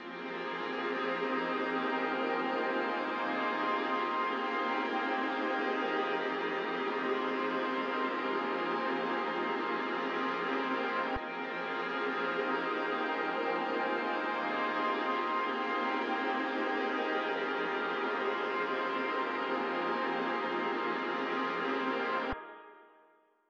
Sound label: mixed pads